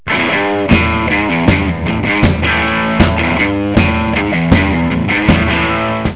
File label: riff